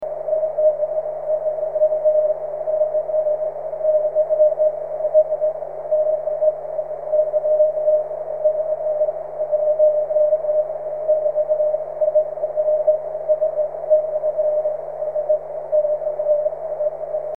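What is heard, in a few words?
13cm continuous wave; ok1kir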